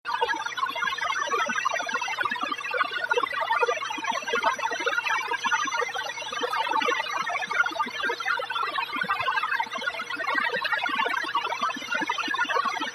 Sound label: Alien Data Syntheti